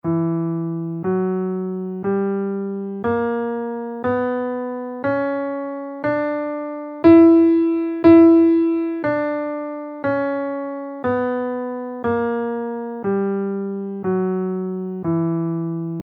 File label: dorian 4 scale in Em